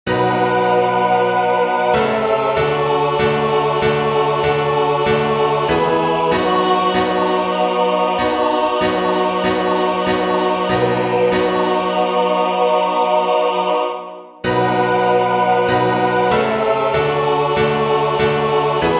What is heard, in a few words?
Tutti